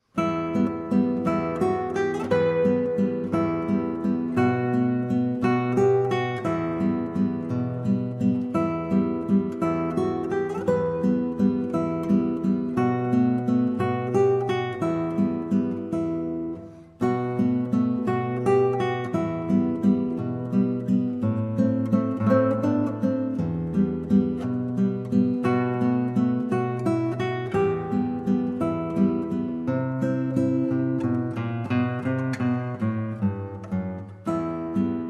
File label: waltz; calatayud